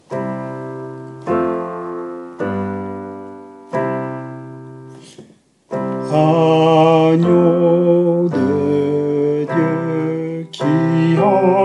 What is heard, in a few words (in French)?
Agneau de Dieu; Messe du Peuple de Dieu